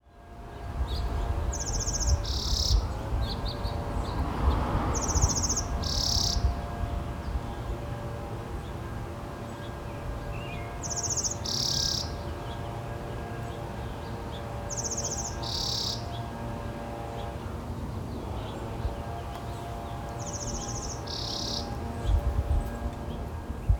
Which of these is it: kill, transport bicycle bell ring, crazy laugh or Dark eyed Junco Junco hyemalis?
Dark eyed Junco Junco hyemalis